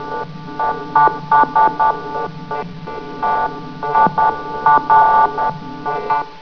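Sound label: computer talk; zombie grunt hunt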